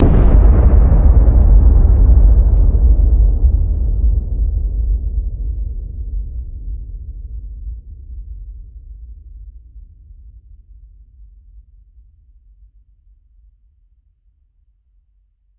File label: Explosion Massive; Explosions; DynamicEuropa